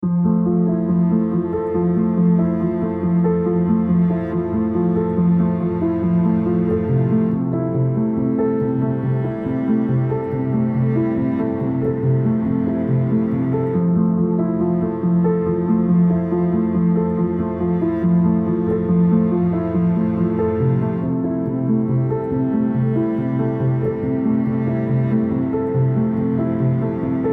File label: I m scared (Piano Version)